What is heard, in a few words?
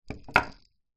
household pepper mill wooden large set down table